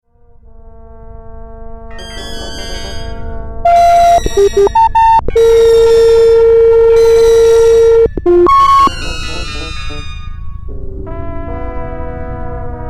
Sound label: network instrument capture